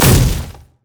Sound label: zone knockup; Zyra; LoL